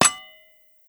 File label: GUI Mouse Over Tower